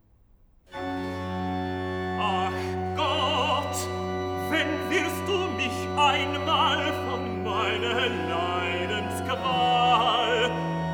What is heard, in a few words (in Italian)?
Cantata, Recitativo (Tenor); BWV 98 'Was Gott tut, das ist wohlgetan'; Bach; Greenwich; 21st Sun After Trinity